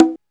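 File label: 727 high conga, Roland TR-727; Giga Samples Collection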